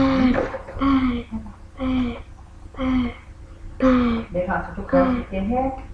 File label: bell